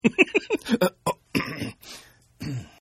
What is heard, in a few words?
Giggle Male; Laughter; Partners In Rhyme